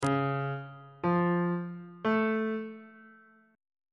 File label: c sharp augmented triad chord intervals on bass clef